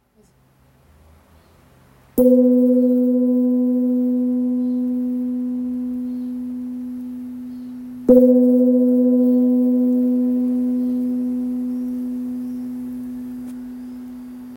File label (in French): Gong birman Si 1.1kg